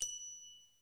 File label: count Up Complete; fireflies